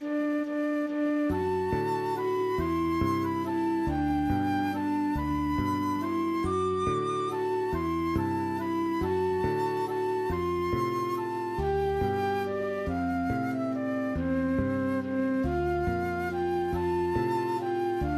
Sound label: instrumental; fruehmorgens da die sonn aufgeht; patmos-gemeinde